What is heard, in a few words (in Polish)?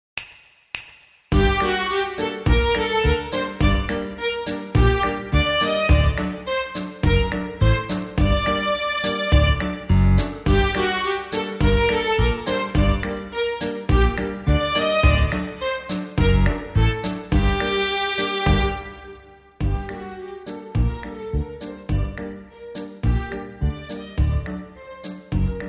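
muzyka głośna i cicha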